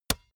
basketball dribble tap; sport freizeit

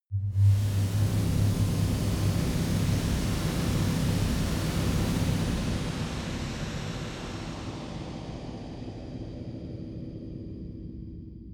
atmo drone stinger, horror

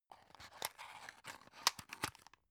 drug open soft; berufe; arzt

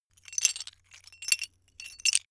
metal pieces clatter

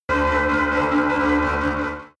extrinsic creak; energie

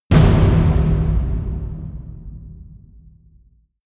huge tom hits; music elements; percussion

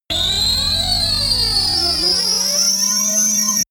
engine room; energie

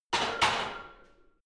barbell heavy plates replace rack; sport freizeit; fitnessstudio

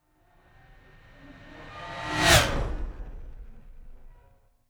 fly by hover car hum high

